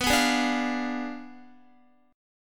B Diminished 7th B down Guitar

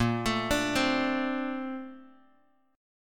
A sharp Major 9th, Bass Standard; arpeggiated

